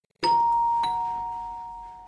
ding dong

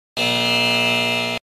ERROR

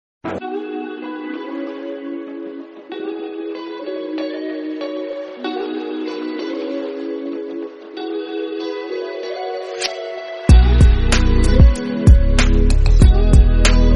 Classy Bgm

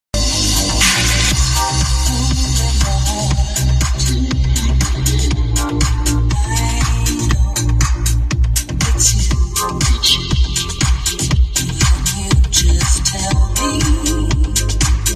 music up

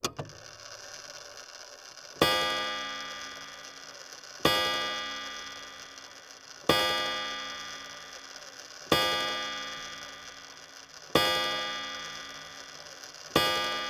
antique wood chime bell ring

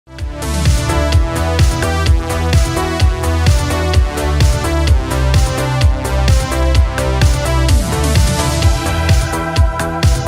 80s synthwave and retrowave background pack

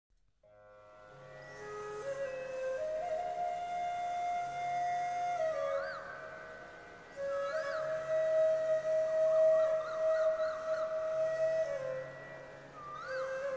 hare krishna relaxing music

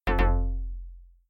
incorrect chime

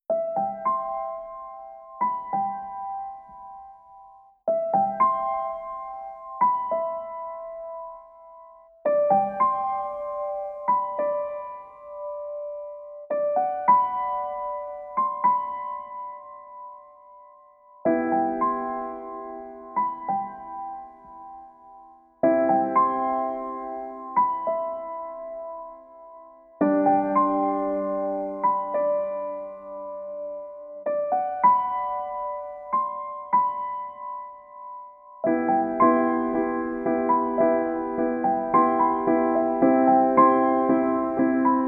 Nostalgic Piano

